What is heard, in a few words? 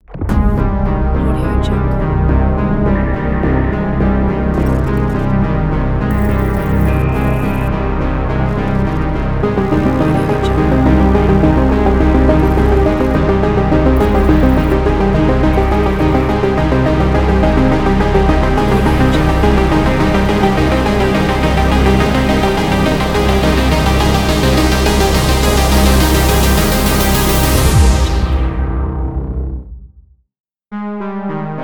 80s mysterious retro trailer ident; puremusic